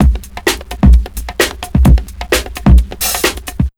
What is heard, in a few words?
JMP 130 LP, Big Beat Drumloops; JUMP 130